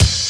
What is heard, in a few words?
Open Hihat, Cymbals; Vengeance Samples